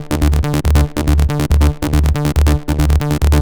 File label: Bassline WET 140BPM, Dminor; Vengeance Samples